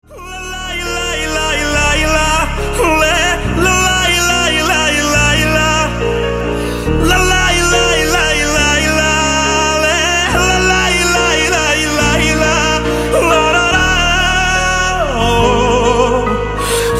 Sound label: Humming Lala la